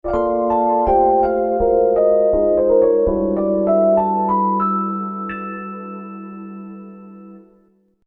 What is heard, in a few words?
e piano